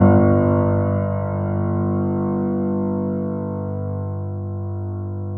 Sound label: PED MF G, GRAND PED; Best Service Advanced Media Trax Modern Composer